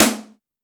present steel snare drum sound A key, snare drum sound tuned to the A note